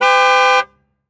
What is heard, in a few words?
Transportation Car Monte Carlo Exterior Horn Beep Medium